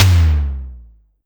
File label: Electro Tom Lo Down, Clavia DDrum-AT, Pitch Env; Pitch Env Various